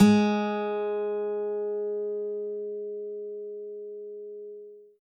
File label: g sharp, guitar